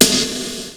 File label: Fairlight CMI III Snare, Drum Machines